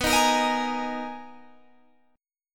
B Major 13th B Guitar Open G

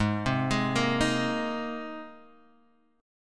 Ab Major Add 9th Ab, Guitar Standard; arpeggiated; 4,3,6,3,4,x

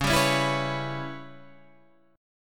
Db Minor 7th Flat 5th Db Guitar Standard; strummed; 9,10,11,9,12,9

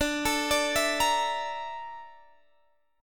A sharp Major 7th Flat 5th D

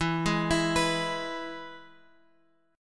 E Major, down Guitar Open G

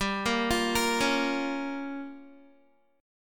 G Minor 7th Flat 5th, Guitar; Gm7b5; arpeggiated; 15,13,15,15,13,x

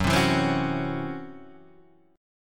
Db Minor Major 7th Double Flat 5th Gb

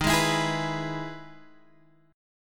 E-Major D sharp

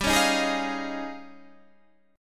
D sharp 11th G

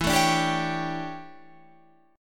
D Major 7th Suspended 2nd Suspended 4th

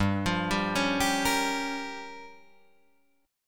G Minor 7th Flat 5th, Guitar Drop D

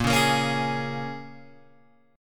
A sharp Minor 7th, A sharp, down, Guitar, Open G; A#m7; strummed

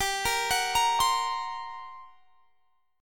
G Minor Major 7th Double Flat 5th G down; arpeggiated; GmM7bb5

